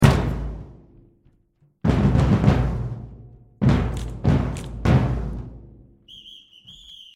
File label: play my drum solo